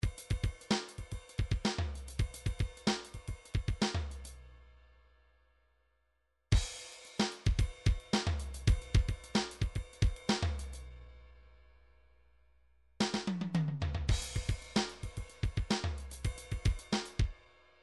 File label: midi drums; Bruford Midi Drums